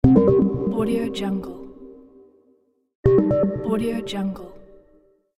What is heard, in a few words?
scifi signal